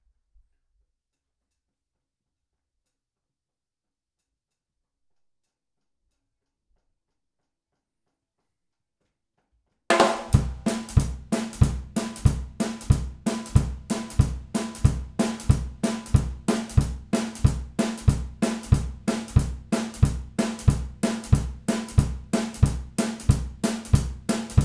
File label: Drums Stereo; Stereo Dowmix